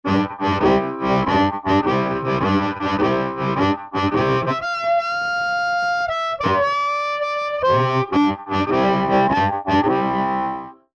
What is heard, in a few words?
Harp 12 O Clock C; TubeUlent